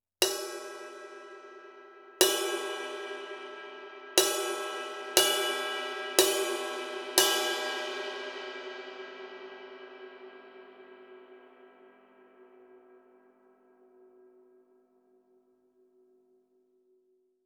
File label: Medium Thin High Bell, ride cymbal; 22" Kerope Medium Thin High Ride